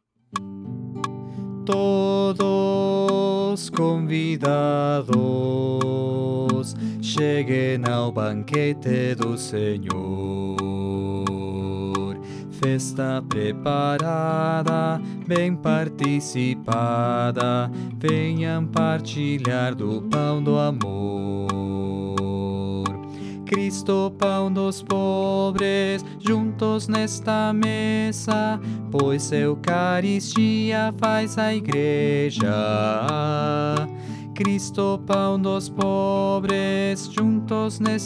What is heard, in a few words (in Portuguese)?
Cristo pao dos pobres Baixo